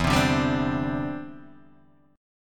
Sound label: E Minor 7th Sharp 5th, down, Guitar, Open D